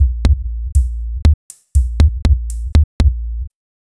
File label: Bassy Beat, Electronic Beats; Sonic Foundry (Sony Creative Software); Myths of Technology